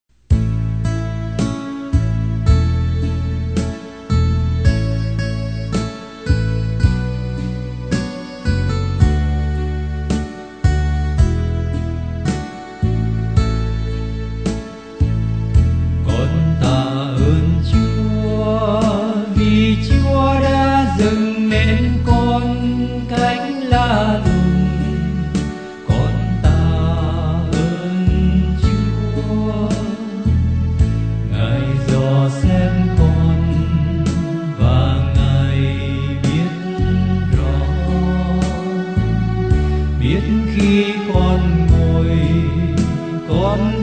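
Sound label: soprano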